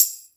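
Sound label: Tambourine, Roland MC-303 Percussion; Drum Samples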